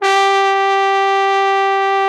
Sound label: TROMBO; Trombone